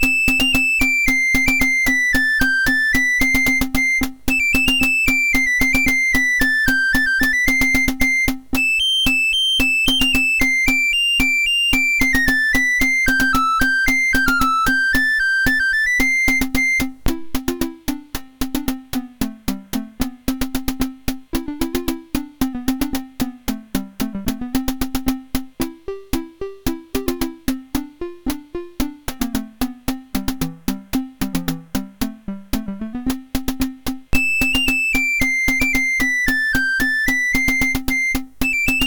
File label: Jig; Kemps Jig; MOS6581R3